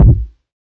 Fracture Kick, Found Percussion Hits; ModeAudio Found Percussion Hits

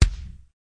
Kick Metal, DrumKit; REAL LIVE KITS; Osama Qureshi DrumKit

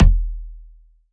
kick musical; Kicks; single hits

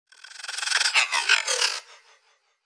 alien mating call; Electro and Synthetic

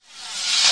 rev flang cym; Cymbals; single hits

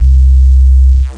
distorted bass wave; single samples; Basses

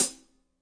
classic hh Str; STYLE KITS (Hip Hop, Etc); Classic Hip Hop Mega Kit

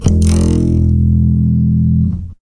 Upright Bass Notes

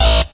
blart, electronic hits

